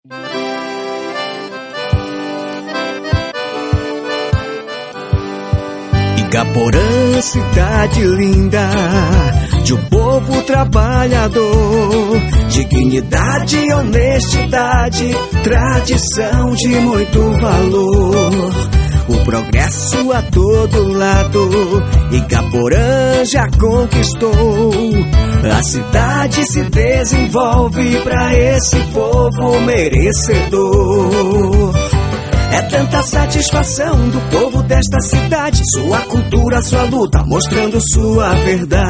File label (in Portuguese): Jingle politico